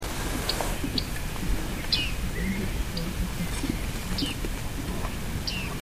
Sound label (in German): bartmeise